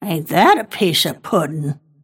last hit pain; snapfire

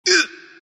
embr pain; dota; ember spirit

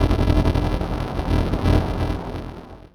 null field enemies; weapons; dota; rubick

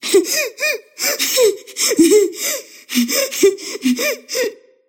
laugh; kidvoker; dota; invoker

